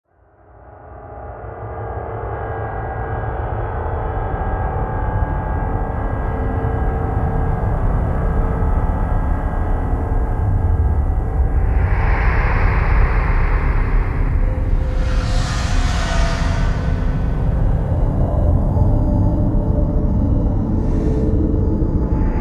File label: Subterranean Rumblings; Gothic Storm Music; Brain Warp (Sound Design Trailer Tools) 2011